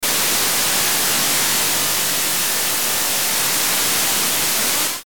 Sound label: Static Smooth Hiss, Static Noise Dark Crackle Reverb